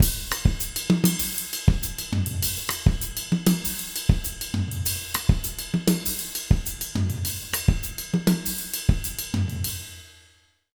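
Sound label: 100 Mozambique, jazz latin drumloops; USB Soundscan vol.08; AKAI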